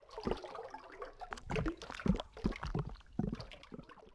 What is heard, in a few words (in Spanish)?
dentro del agua